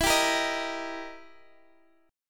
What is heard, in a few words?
E Minor Major 7th Flat 5th E down Guitar Half Step Down